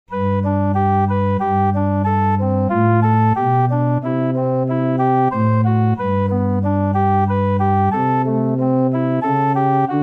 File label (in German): Zum Sanctus (Fagott Solo + Orgel-Playback)